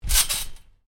Trampoline, bounce on: light bounce on canvas trampoline; Miscellaneous Sport Sound Effects; Stuart Duffield